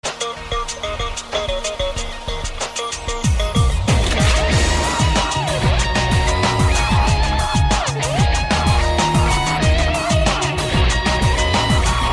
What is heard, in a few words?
Guitar Solo